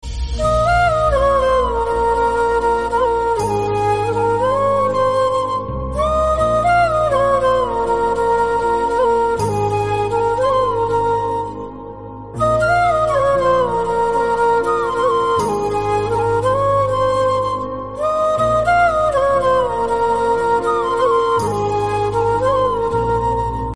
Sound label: flute; kgf 2